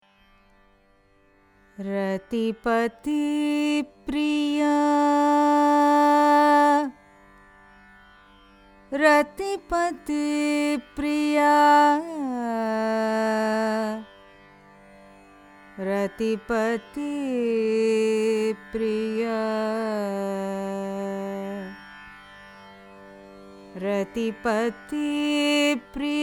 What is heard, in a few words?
raga rathipathipriya signature; carnatic music